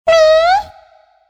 click; monstergo; pokebot

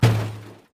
object hit plastic drum; lightning speed

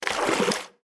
tile move; inlogic-bunnyquest

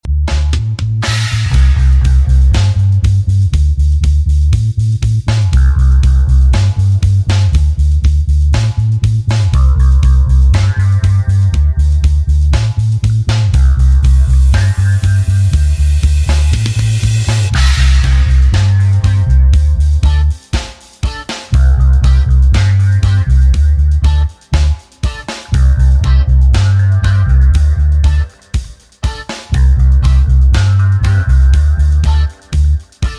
menu; inlogic-basketballmaster

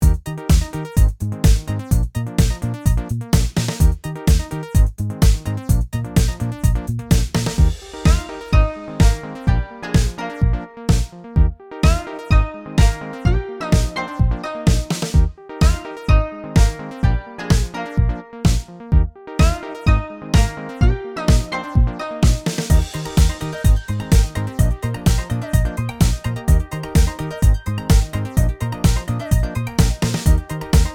music; inlogic; bunnyquest

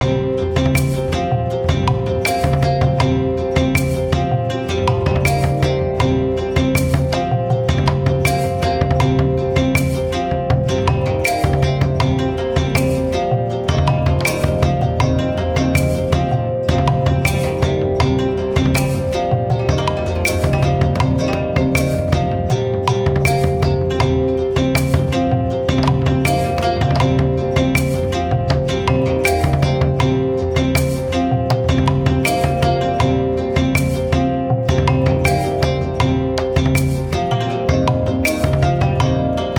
music; ninjarun

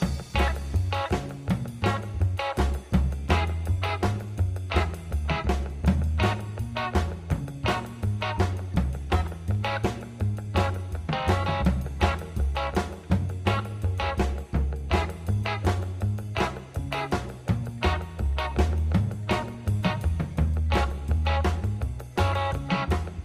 race loop; lightning speed; italy

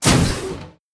door; star-wars-rebels-team-tactis

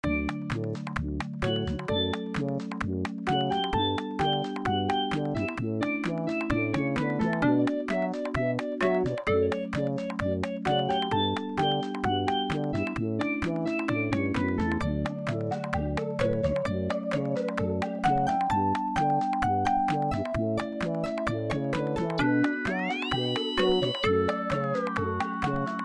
menu home; Find the Penguins

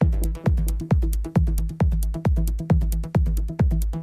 intro sting; lightning speed; germany

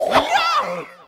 scream; Ninja-Action-2

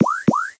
hazards alert; spider-man-mysterio-rush-game

